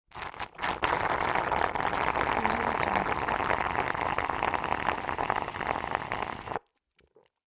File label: yerba mate long slurp; human sounds